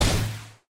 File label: Fire sound; Criminality